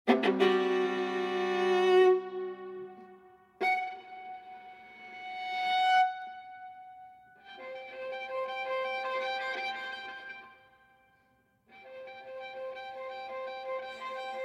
Loop For Solo Viola and Electronics

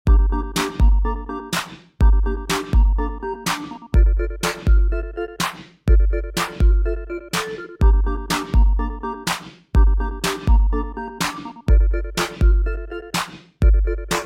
Instrumental

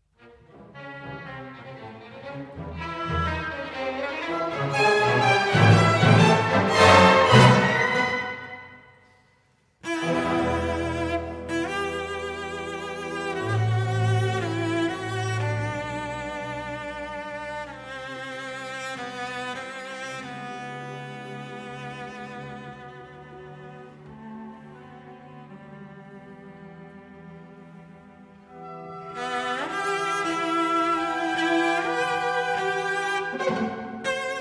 Cello Concerto in E Minor IV. Allegro

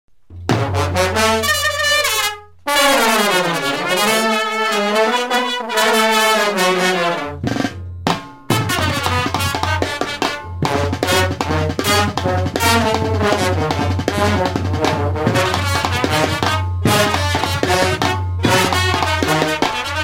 Brass Band

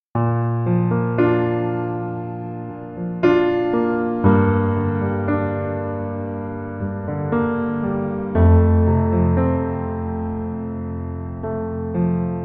Instrumental

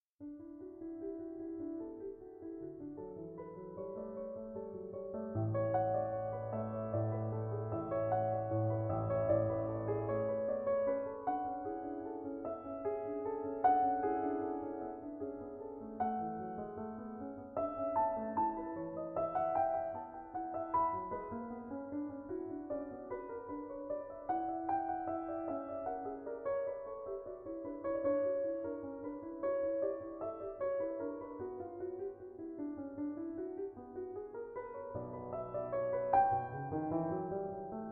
Invention in D Major

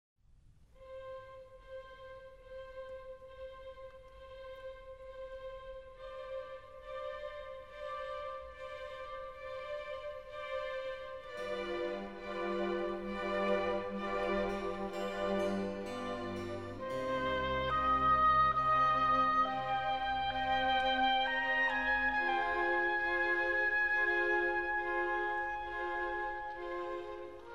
oboe concerto; Oboe Concerto in C Minor; 2. Adagio

